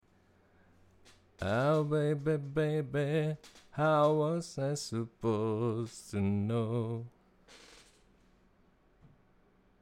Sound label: Creak male